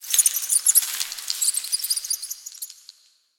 rat panic, underground; ambient

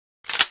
rg6 reload end, weapons

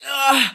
killed; stalker death

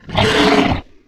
flesh pain, monsters

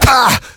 bullet hit pain

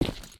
step default

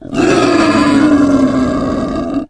boar death

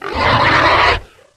flesh panic, monsters

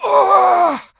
wound, stalker; monsters

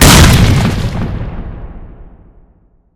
rgd5 explosion

